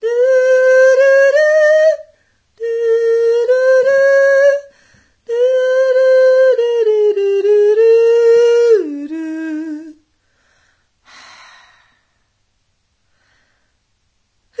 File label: Lullaby; Insomniac